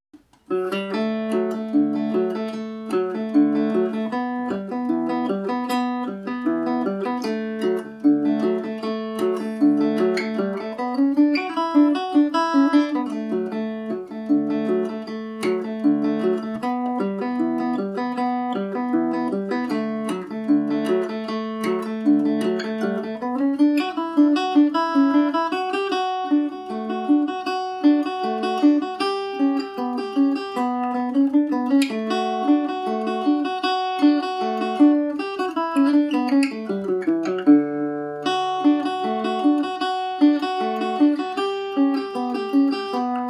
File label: dmaj, mandolin; John Crossey